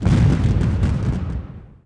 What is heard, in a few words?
Mini Pod Explosion; Warzone